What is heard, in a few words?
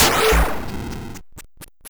odd, explosions